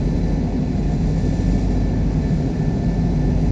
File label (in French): Faucon interieur; vaisseaux